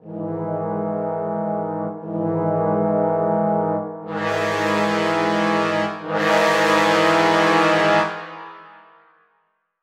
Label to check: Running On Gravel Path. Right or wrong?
Wrong